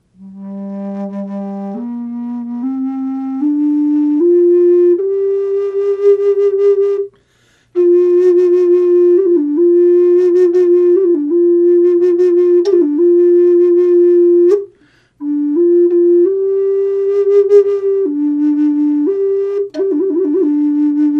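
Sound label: natural, wood flute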